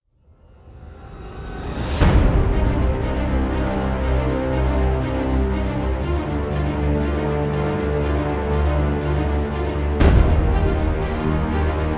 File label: orchestral trailer